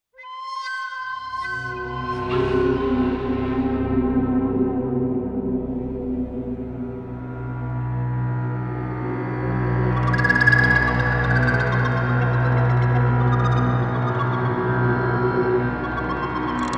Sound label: 74 BPM; ForestFutureEnter